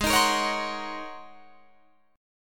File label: Ab 11th Ab Guitar Drop D; Ab11 strummed